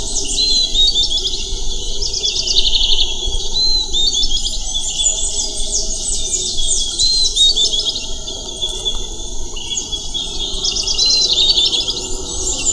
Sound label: bird